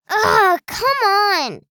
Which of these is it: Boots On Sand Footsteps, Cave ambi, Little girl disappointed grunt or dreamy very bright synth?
Little girl disappointed grunt